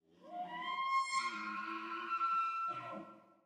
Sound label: bugle, cervidae; ambient